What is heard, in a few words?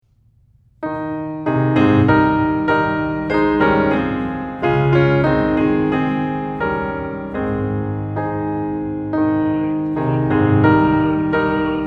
Piano; My God How Wonderful Thou Art; piano accompaniment pieces